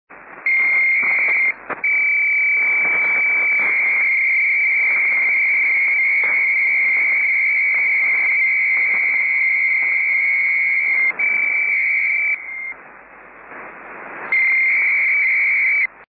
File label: Pkt300